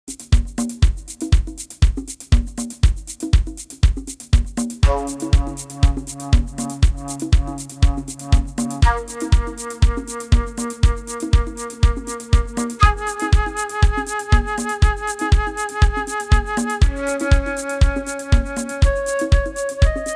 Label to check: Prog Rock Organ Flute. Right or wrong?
Right